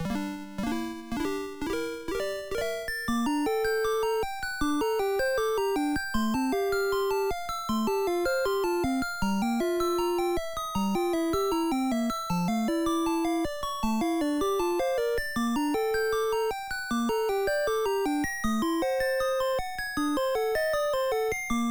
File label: Peaceful; super mario maker 2; Link